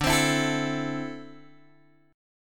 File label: D 7th Suspended 2nd down Guitar Open G